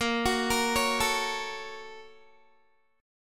A sharp Minor Major 7th Sharp 5th A sharp Guitar; A#mM7#5 arpeggiated; 18,21,20,18,21,x

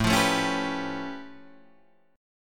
A Augmented 9th A Guitar Open D

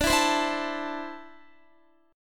D 7th Suspended 2nd down Guitar; D7sus2; strummed

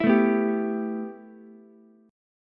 A 7th Suspended 2nd A, Ukulele; strummed

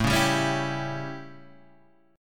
A 7th Guitar Open G, chord

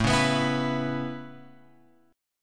A Major Add 9th A, chord; Aadd9 Chord {5 4 X 4 5 7} strummed

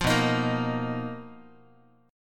B Major 7th Flat 5th B down Guitar; strummed

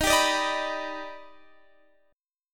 Eb Augmented Major 7th Eb down Guitar Standard; Eb+M7 strummed; JGuitar

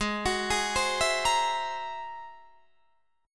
G sharp Augmented 9th G sharp Guitar Open D

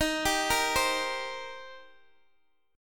D sharp 6th, D sharp, down, Guitar, Open G

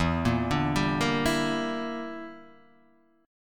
E Minor 7th Flat 5th E, Guitar DADGAD

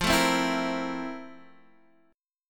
F Major 11th Guitar Half Step Down

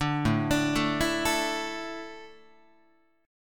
A Suspended 4th, Guitar; Asus4 arpeggiated; JGuitar

